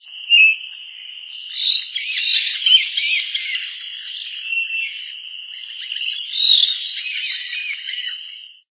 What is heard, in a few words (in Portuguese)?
marianinha